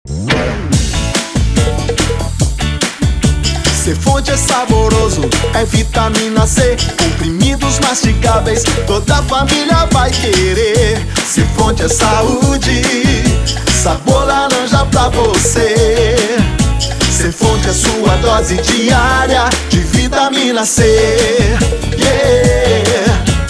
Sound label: Jingle; Head Produções